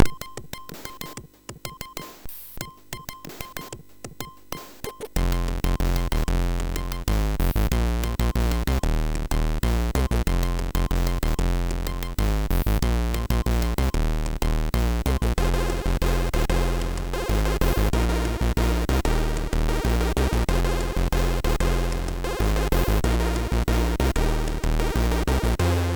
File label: Natural Beat, MOS6581R3